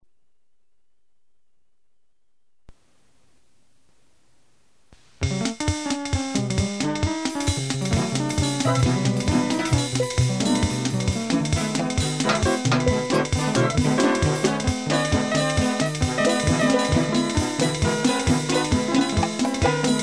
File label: abstract jazz